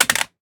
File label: pistol drop concrete; STALKER; IWP